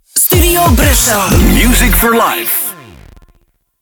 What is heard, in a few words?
Key Sweep F 91bpm; StuBru; Music For Life